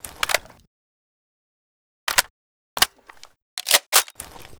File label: l86a1 reload; STALKER